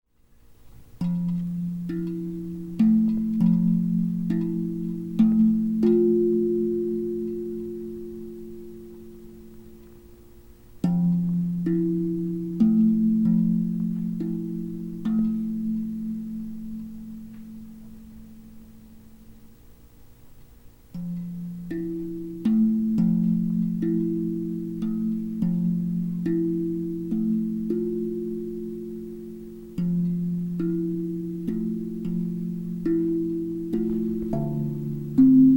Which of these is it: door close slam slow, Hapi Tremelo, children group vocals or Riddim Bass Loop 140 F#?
Hapi Tremelo